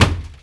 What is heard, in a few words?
Ball Hit Hoarding